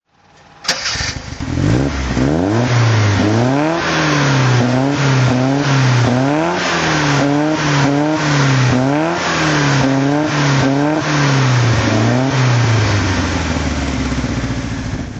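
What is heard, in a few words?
jensen healey throttle, Engine sound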